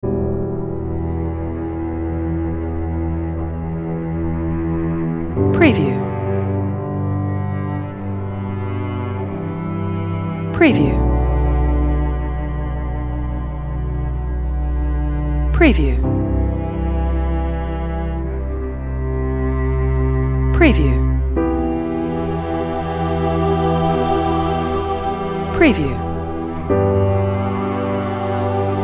Dramatic Piano Strings Cello Choir Emotional Sad Film Score